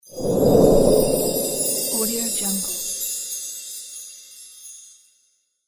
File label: magic chimes and wind; archisound